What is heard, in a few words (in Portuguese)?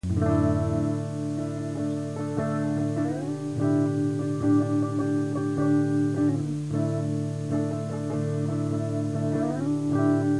registro de ensaio acustico